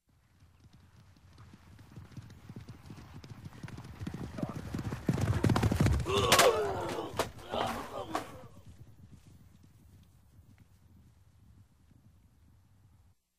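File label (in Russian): двое на лошадях врезаются; Звук мечей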